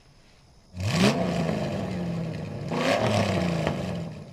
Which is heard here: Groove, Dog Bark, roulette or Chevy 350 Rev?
Chevy 350 Rev